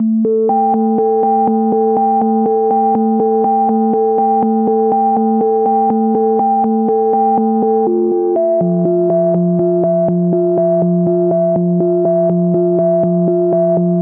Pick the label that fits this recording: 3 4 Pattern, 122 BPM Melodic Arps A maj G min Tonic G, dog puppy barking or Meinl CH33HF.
122 BPM Melodic Arps A maj G min Tonic G